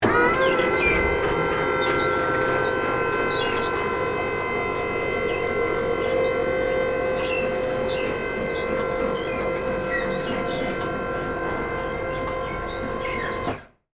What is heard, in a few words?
Hi Lo Lift Up, Industry, Hi, Lo, Lift, Up, On, Board, Perspective; Machinery Sound Effects; BlastWave